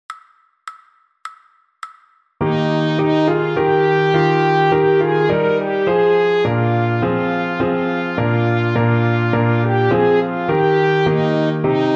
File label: trumpet slow; Den VI Ronde